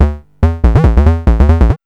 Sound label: B-LOOP; X-Static Goldmine 1